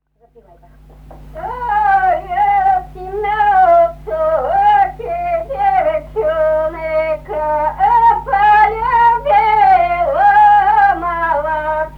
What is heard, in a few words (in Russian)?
лирическая; Доброе; Ой, лет семнадцати девчонка